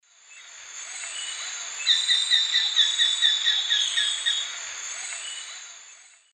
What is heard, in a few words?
Veniliornis affinis, call; S. America; Eastern Lowlands